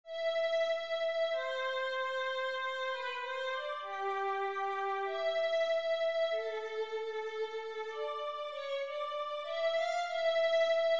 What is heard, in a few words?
rosamunde andante violin